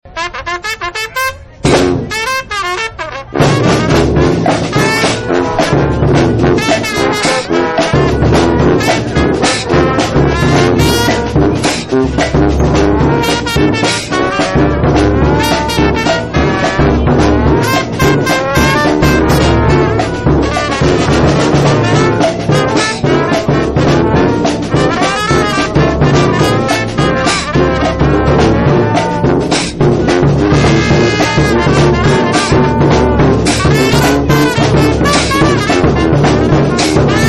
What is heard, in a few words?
Jazz Trio dixie